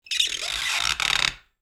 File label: Rubber Squeak